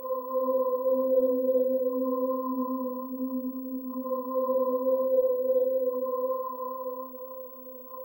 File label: hum